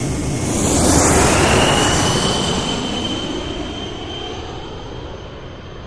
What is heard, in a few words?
Jet Take Off Truncate, land & takeoff